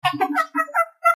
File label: bird